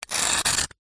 foley glass sharp broken piece scrape scratch another